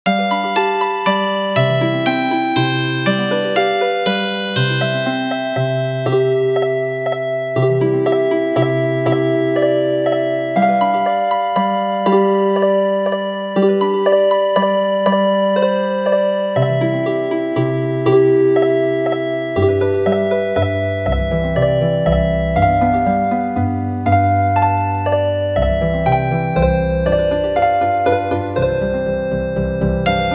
Healin Harp

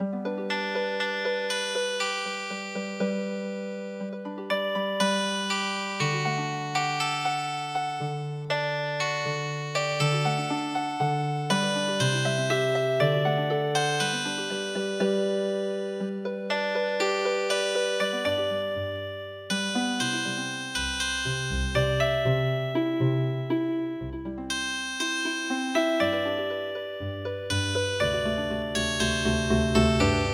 Healing Harp, traditional; Terang Bulan

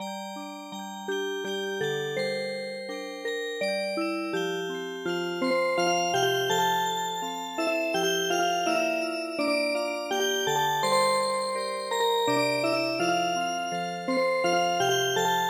Swiss Music Box; traditional

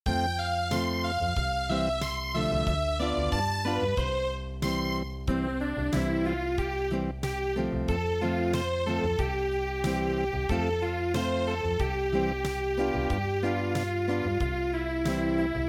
Unplugged, traditional

